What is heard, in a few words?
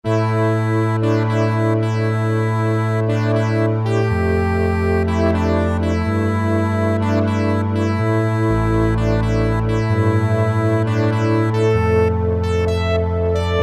new wave, Technics SX-K450